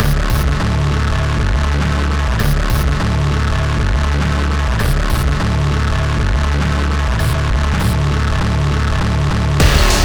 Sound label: omm full inst